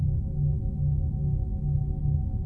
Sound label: Eng Amb; trek